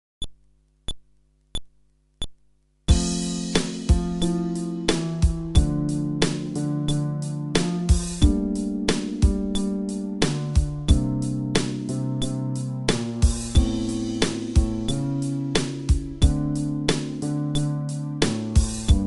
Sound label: Groove, fretless bass